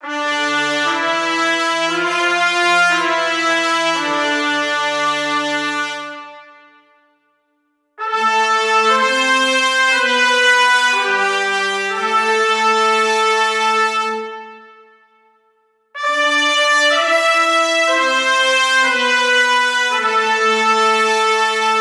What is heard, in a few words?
Horns - Am 120BPM; Construction Kits; STEMS; Ghosthack; SkyRealm; Kit 21 - Mystical Journey